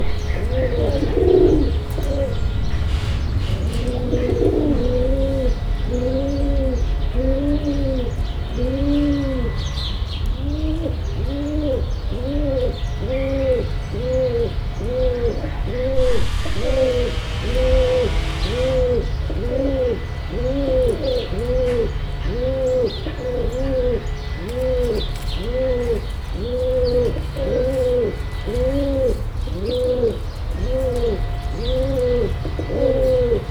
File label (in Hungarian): a vége felé egy szárnyalás, debreceni pergőgalamb; debreceni zoo 2019